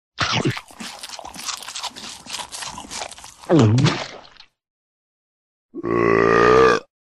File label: chew and burp ugly